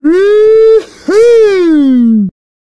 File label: Man Woohoo; Zomboid; Lifestyle